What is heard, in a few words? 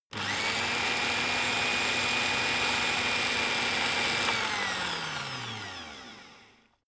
electric whisk spin rear; haus-garten; kueche